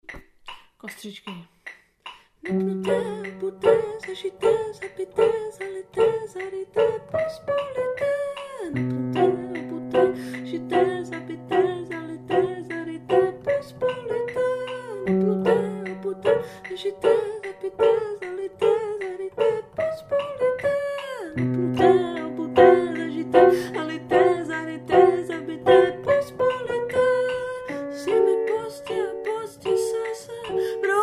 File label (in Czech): klavir